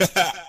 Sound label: ha ha